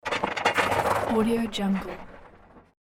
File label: evolving resonant shapeshifting tone; hollywoodedge